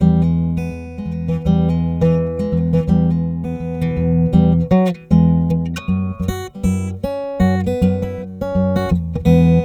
guitar through banjo invfz

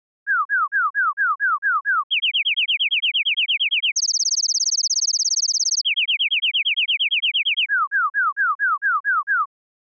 birdcall

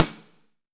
snare

